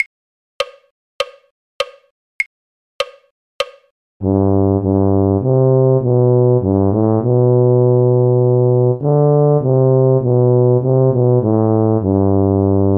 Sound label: tuba; carols